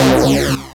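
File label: Rave Synth, Dance Mega Jungle Rave; Best Service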